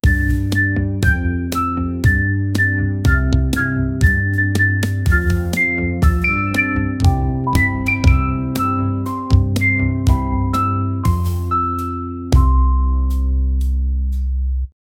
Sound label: create a mischievous and, background music